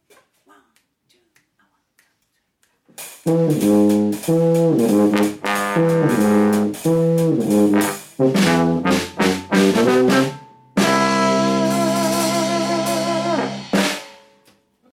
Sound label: Jingle